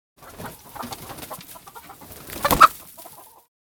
Chicken squawking flapping wings; animal

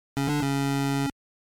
Retro 16 bit or 8 bit game over tune

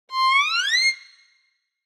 Cartoon violin gliss up

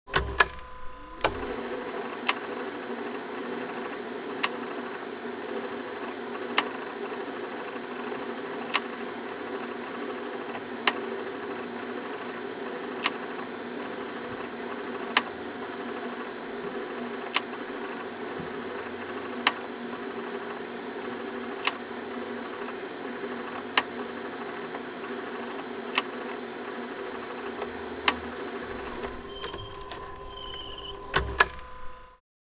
Cassette tape player fast forward or rewind

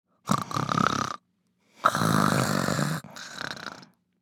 Young female heavy snore

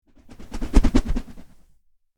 Boomerang flying whoosh; cartoon

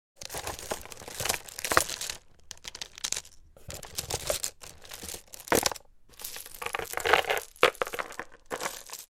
Jewelry clacking and rattling

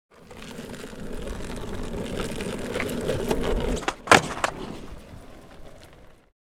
Skateboard ollie

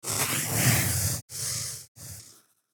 Pencil drawing smooth medium stroke

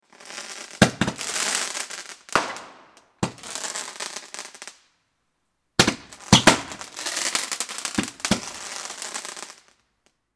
Fireworks firecrackers candle small pops

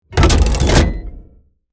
Huge mechanical lever pull; steampunk; gfxsounds

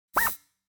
Sci-fi robotic servo motor small

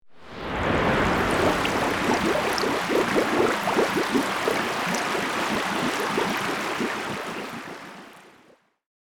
Water magic spell water manipulation

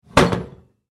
Kitchen oven oven door close; cooking

